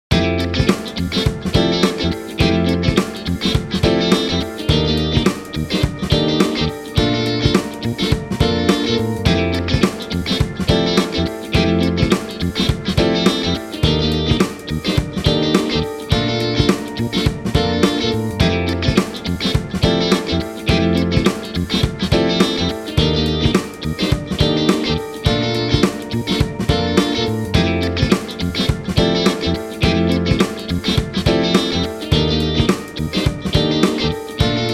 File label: rhythm